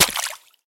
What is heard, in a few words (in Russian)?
negromkij vsplesk ot malenkogo kamushka